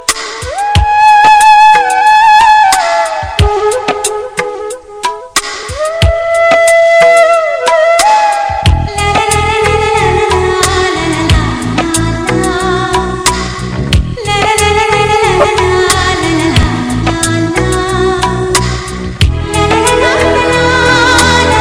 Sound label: flute tone ringtone